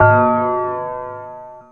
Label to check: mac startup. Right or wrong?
Wrong